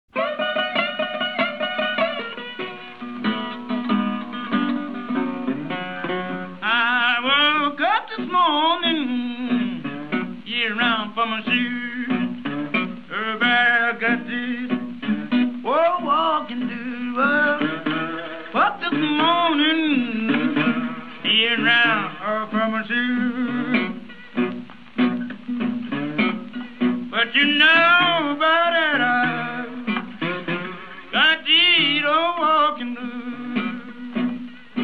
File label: Walking Blues